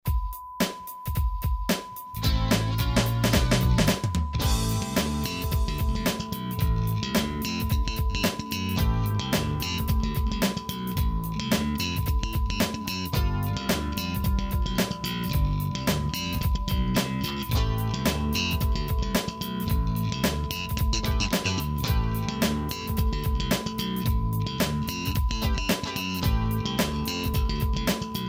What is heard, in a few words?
Bassman Sound Works